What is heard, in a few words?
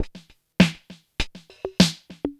drloop, drumloops, Vinyl Attack; Masterbits